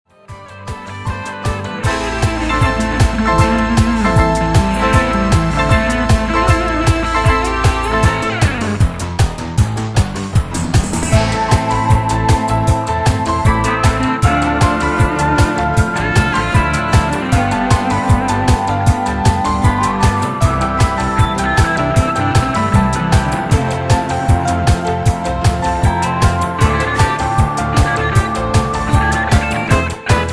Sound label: Piano